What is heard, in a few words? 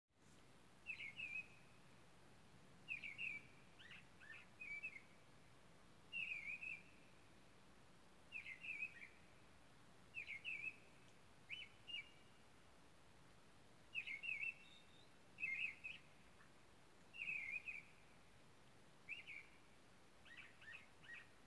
Bird